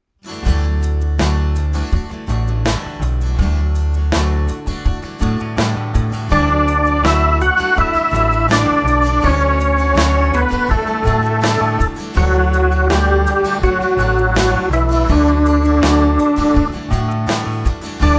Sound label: melodic dictation